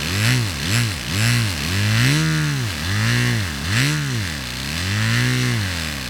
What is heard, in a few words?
motorcycle